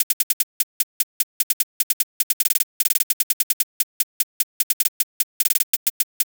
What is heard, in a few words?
Hi-Hat Loop 150; Dubstep